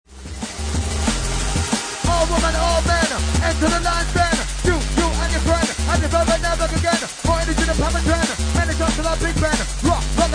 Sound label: DNB